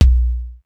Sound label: hirap kik; sr16 bd